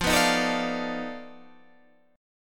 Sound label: B 7th Sharp 9th Flat 5th, guitar